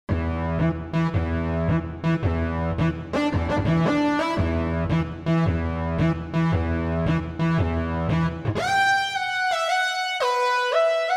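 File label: VCO Oct Lead, Roland SRX-07 Ultimate Keys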